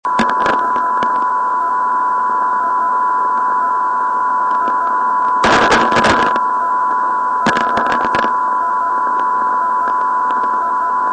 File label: 50 kHz; irkutsk